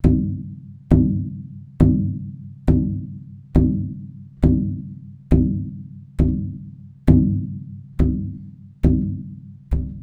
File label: Big Drum hands; Sneak Up (Gittantmeah)